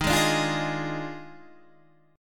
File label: Eb Major 7th Suspended 2nd Suspended 4th Eb Guitar Open D